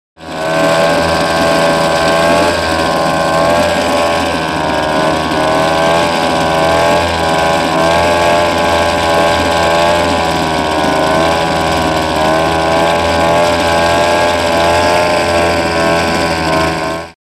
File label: Outboard Motor; Run; Outboard Motor Running.; powerboat